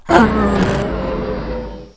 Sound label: death; Alien Arena; megaman